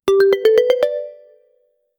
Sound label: question revealed message, trivia